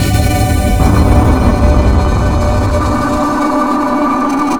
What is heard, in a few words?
FX PAD; Big Beat Samples & More; AKAI; OTHERFX KIT